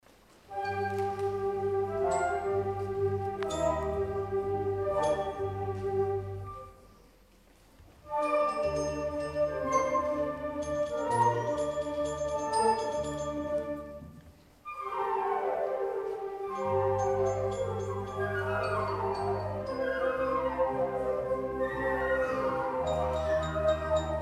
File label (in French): Eté de Vivaldi Presto Concert; Correspondances